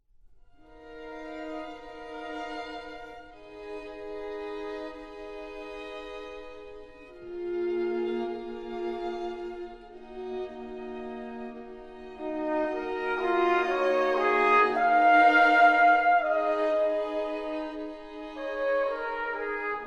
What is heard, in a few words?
Quintet for Trumpet and Strings, Adagio